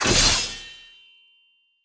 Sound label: sword